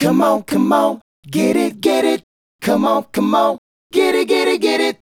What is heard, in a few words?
Male Group, Hip-Hop and RnB Vocals